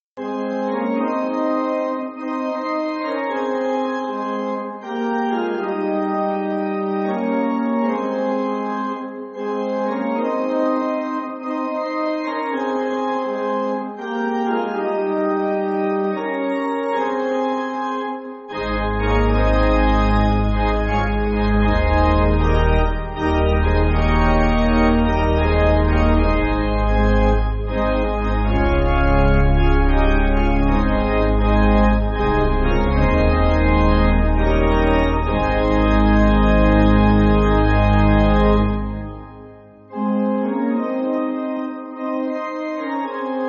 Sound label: Pipe, Pipe Organ, Music Backing